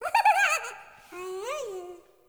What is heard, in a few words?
Sprout Vocal Surprised; Friendly; Sproutfolk; Fey Hub Sprout